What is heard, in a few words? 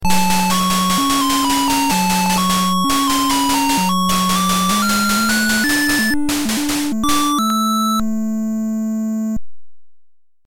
Game Over